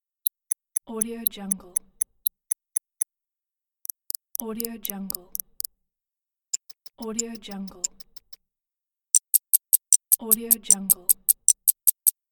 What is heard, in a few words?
small pocketwatch needle motion